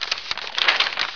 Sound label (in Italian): pagina